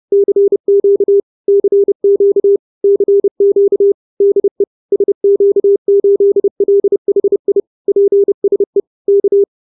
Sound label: 30WPM